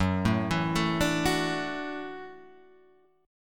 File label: Gb Minor 7th Sharp 5th, Guitar Open G